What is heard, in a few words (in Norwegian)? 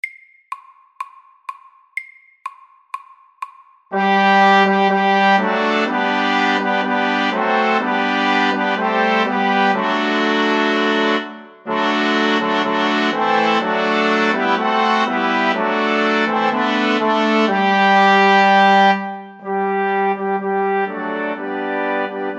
Fanfare for tre